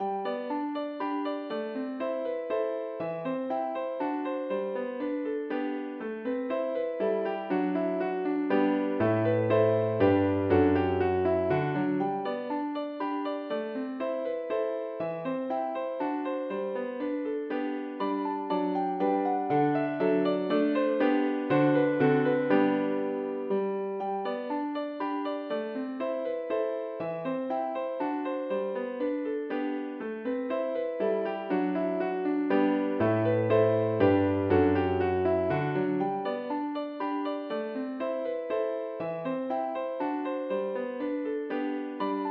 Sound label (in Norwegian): Menuet for piano